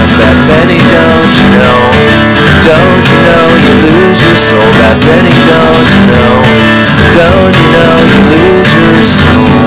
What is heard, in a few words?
Bad P Chorus